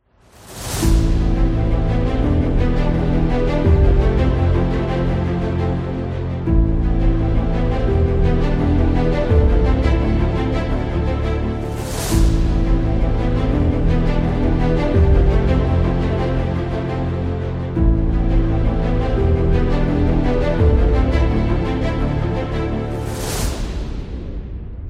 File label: Trailer